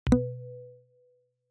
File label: rec Stop